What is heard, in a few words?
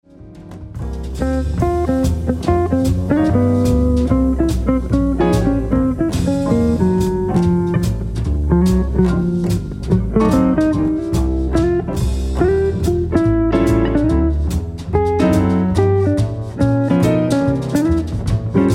Blues